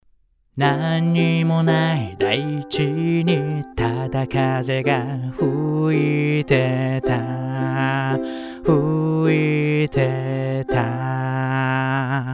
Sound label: Vocal, M5B, U87Ai